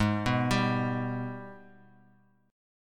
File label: Ab Minor Major 7th, down; arpeggiated; JGuitar